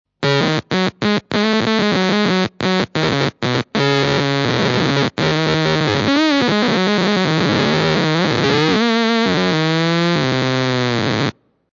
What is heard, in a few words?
stylophone